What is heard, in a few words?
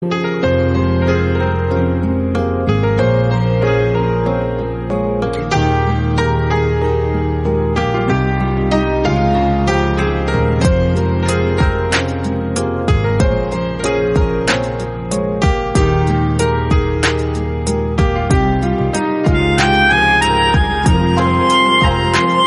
instrumental